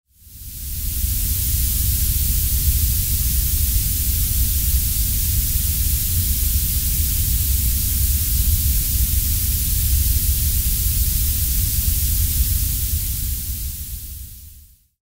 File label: orange purple noise